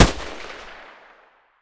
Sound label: ump45 muzzle mono distant